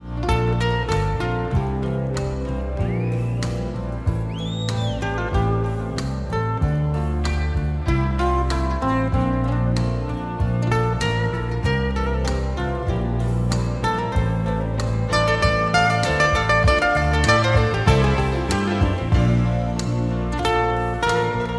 Gibson

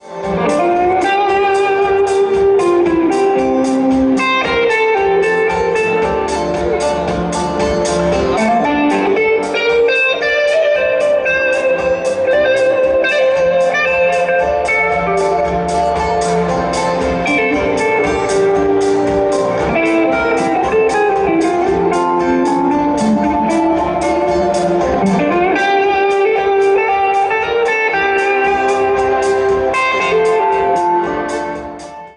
Gibson Blue, LES PAUL R9 BLUE REISSUE; LES PAUL R9 BLUE REISSUE (2010)

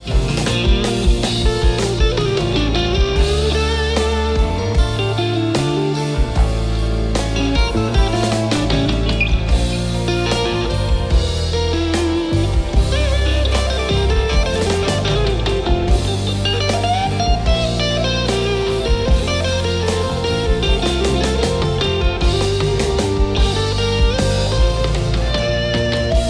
MKII, PENSA; PENSA MKII 1996; GUITARS

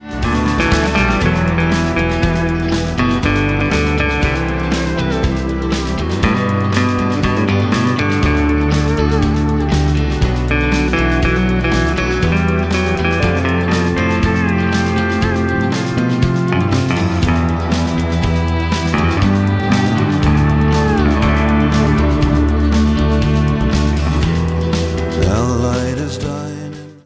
Fender strat 54; FENDER “JURASSIC STRAT” 1954; MAIN STRATOCASTERS

